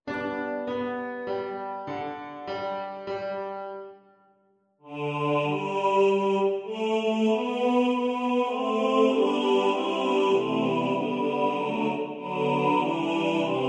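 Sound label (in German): alle Stimmen gleich gewichtet ohne Metronom, Männerchor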